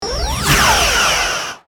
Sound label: phaser clean